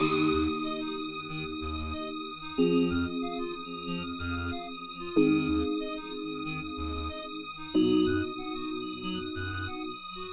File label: Keys FX